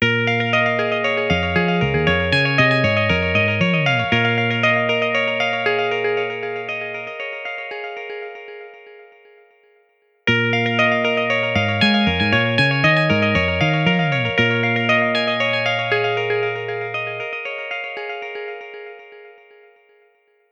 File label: Brave, ringtones; Honor; 600 Pro China